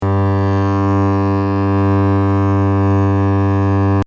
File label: G bass; tuneguide